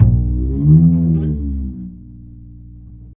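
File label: glissando, double bass; Univers Sons; Jazzistic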